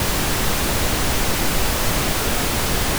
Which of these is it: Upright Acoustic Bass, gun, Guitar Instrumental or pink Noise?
pink Noise